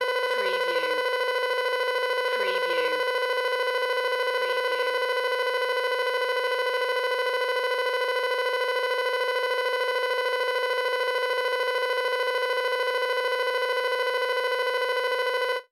Computer Readout

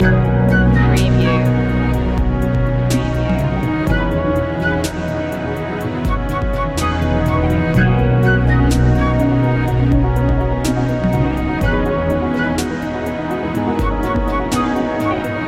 deep technology loop

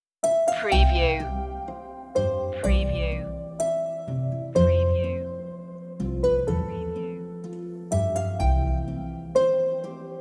sleepy lullaby

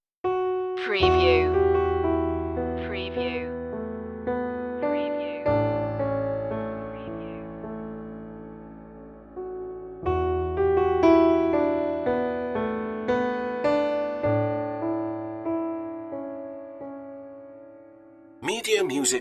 slightly hopeful piano slow

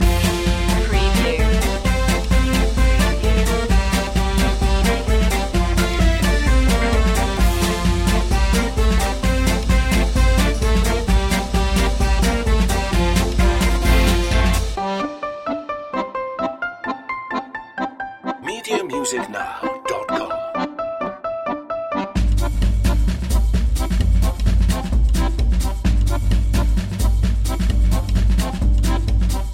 french can-can loop

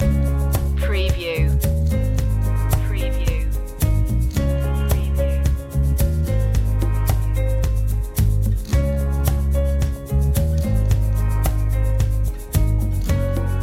sweet tune loop

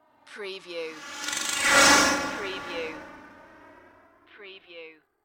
Laser Whoosh Rising

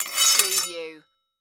Sword Scrape

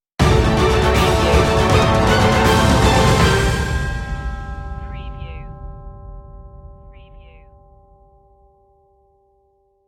land stinger

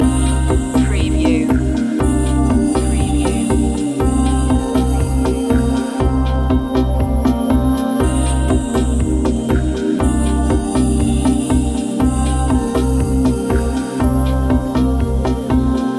downtempo sunset loop

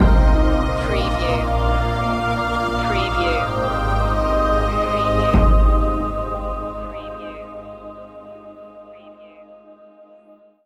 fantasy stinger

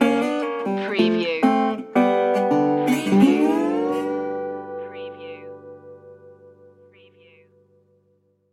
blues stinger

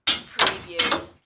Chain Metal Clanging

